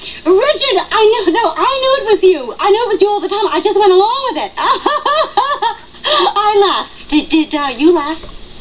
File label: Laugh